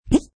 Suction On, Cartoon suctioning sound, suctioning material being attached; Cartoon suctioning sound, suctioning material being attached - Variation 3; Children; Human